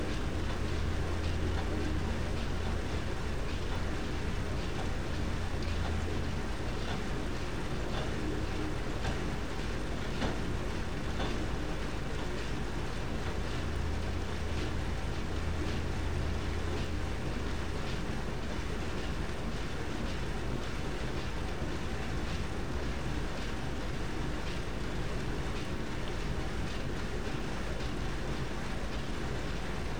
dishwash

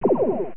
photon soft; Quasar Phase II; bstar

